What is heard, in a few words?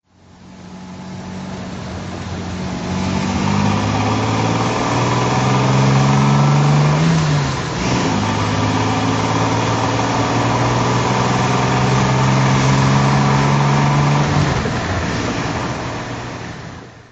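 jaguar xk140 drive, Jaguar XK 140 OTS, 1956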